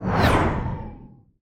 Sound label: ui next area hint